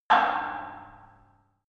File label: metal impact tank inside